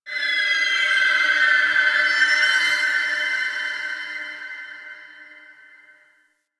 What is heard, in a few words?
Ocean Underwater Creatures Small; Warframe; Grineer Ocean